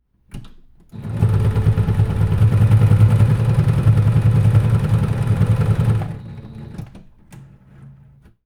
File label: long nearly full speed run set presser foot down, run, stop, release and thread adjustment, Electric sewing machines; Omar Alvarado